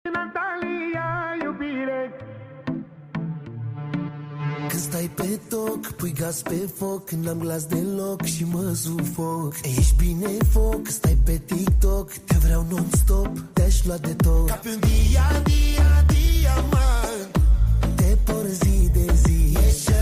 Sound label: Bass Boost